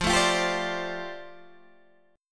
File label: F 6th Add 9th F down Guitar DADGAD, chord